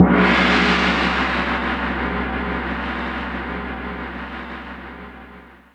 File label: Asian gon, Roland MC-303 Cymbals